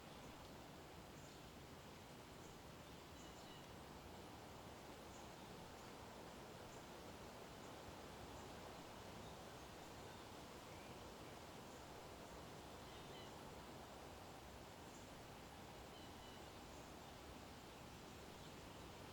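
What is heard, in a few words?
Day Time Ambience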